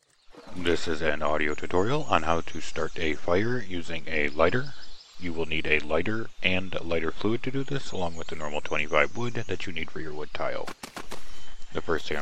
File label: fire lighter